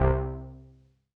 505, String Machines

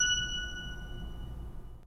3D Pipe Mix Celeste, 3D Organs

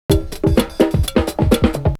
Percussion; Coldcut's Kleptomania VOL1

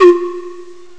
d110 pan; Amiga Soundtracker Sample Packs

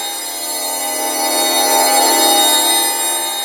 G#4 laser; Northstar; The Wizard VOL-1; FLUROBELLS

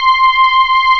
MEM MOOG STR; E-MU Formula 4000 Series Vol. 3 – Analog Odyssey; Vintage Keys

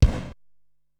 Room Kick, Drum Machines Ensoniq SQ-R-Plus; 300 Drum Machines

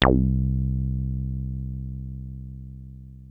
303 A1, TB 303

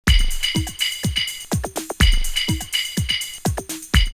Drop Out 124, drumloops, Rave; X-Static Goldmine; 90 s Sample CDs

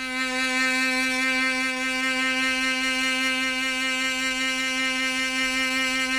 CHAMB CELLO; Sonic Reality; Vintage Timetraveler

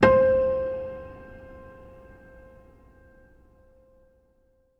PIANO C5; Best Service; Advanced Media Trax Modern Composer; PNO HAMON

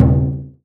KODO, JAPANESE PERCUSSION; AKAI S6000 CD-ROM - Volume 5; Ethic

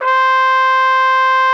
C5, TRUMPET S; Xsample VOL-3

